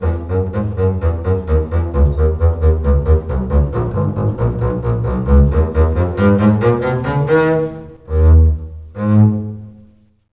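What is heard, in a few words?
double bass; New Century Encyclopedia and Reference Collection II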